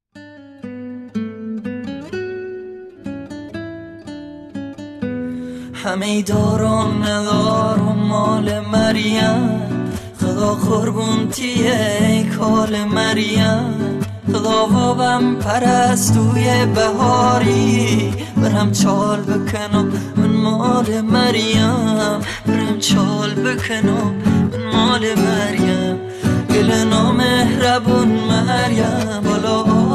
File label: Guitar